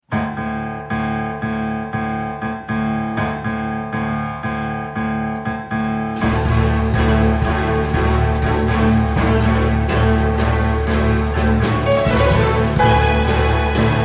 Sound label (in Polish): mazurek D-dur op. 33 nr 2